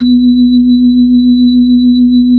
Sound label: B-3 Hollow; Keyboards of The 60's and 70's; B-3 Hollow Ch